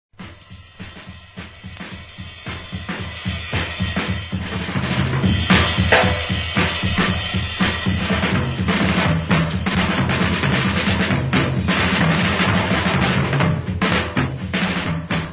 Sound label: drum rip